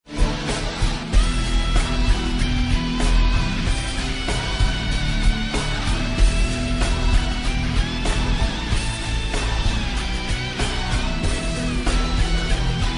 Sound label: Rock Electric Guitar